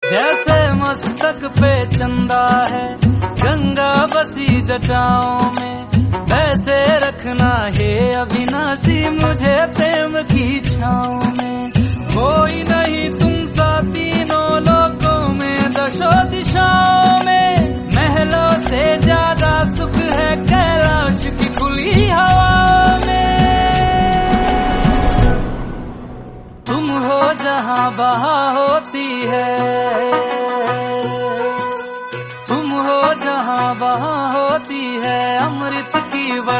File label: Bhakti Song